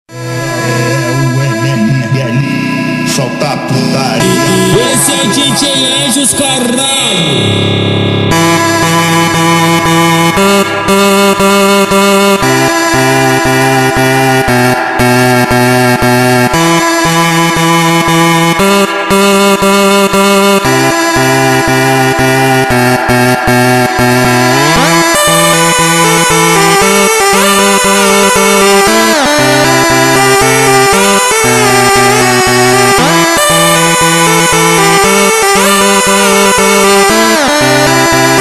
slowed reverb instrumental

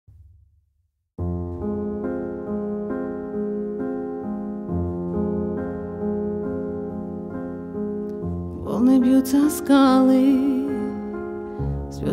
acoustic

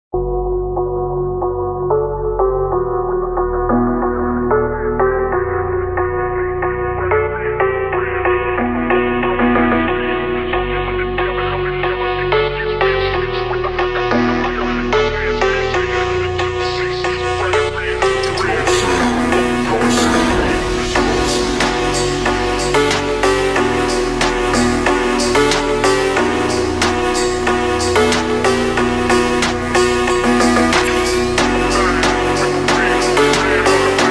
phonk super slowed reverb

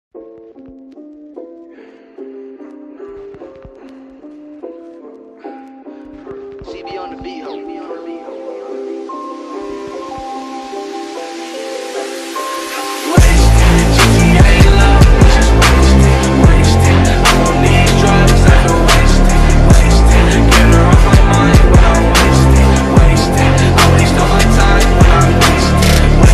nightcore slowed

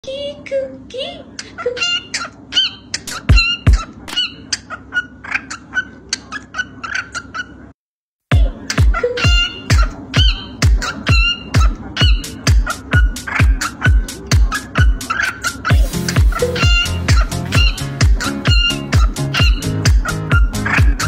cockatiel club anthem; ginger the cockatiel

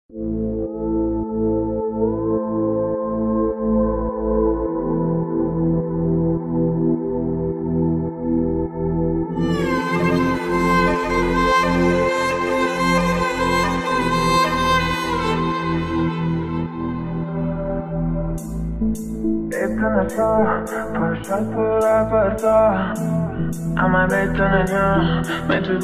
deep house remix

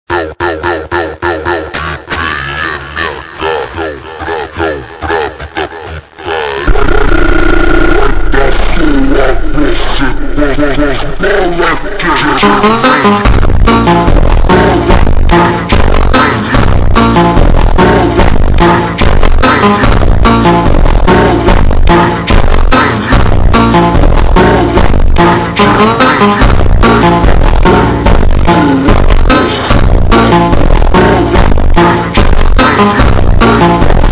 montagem ultra slowed reverb

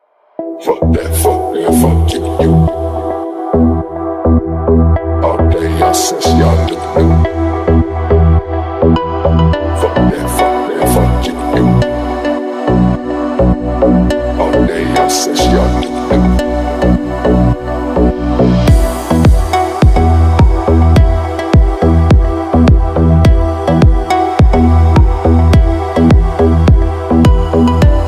deep house car remix

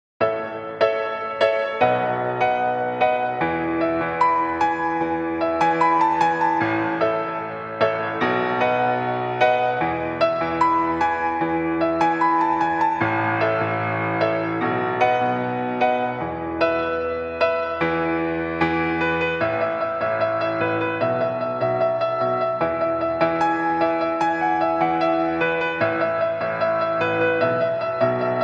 piano karaoke; midi

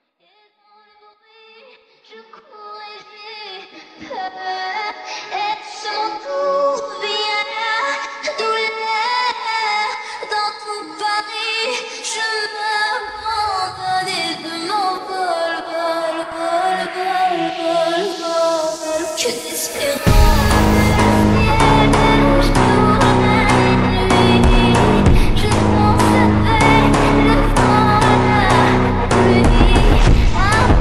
super slowed reverb

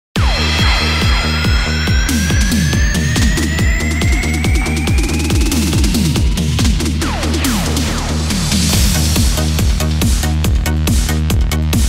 disco party style disco

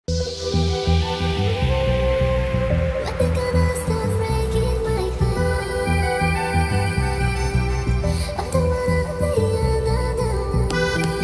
hardstyle

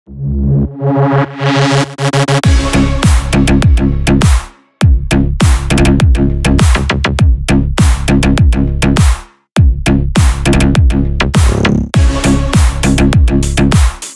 car bass slap cyber bass

